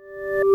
user disconnected

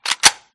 vss switch, guns; resurviv

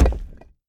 reel Bounce; jumanji

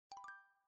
hover

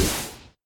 win Line Flash; jumanji

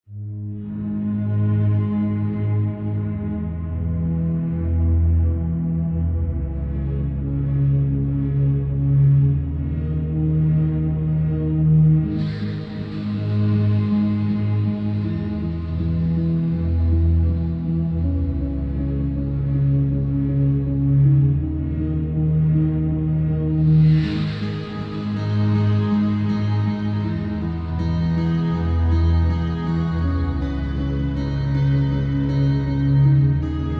Cinematic horror track

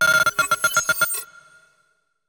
Mine Detonate

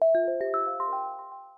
teams call

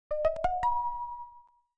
win star; mr bullet

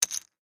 poker bet

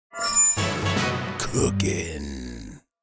Cooking; Good Brew

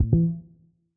wrong

